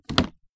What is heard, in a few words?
Put Item